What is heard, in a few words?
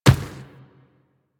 Inf Medium Run Dirt, Infested Footsteps; Warframe